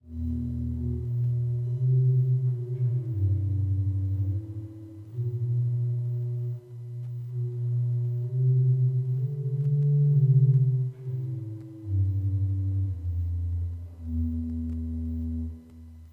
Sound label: subbass 16